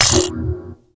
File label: coin; AOS RF1 Kart Demo